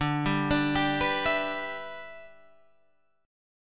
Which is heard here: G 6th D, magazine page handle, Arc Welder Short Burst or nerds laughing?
G 6th D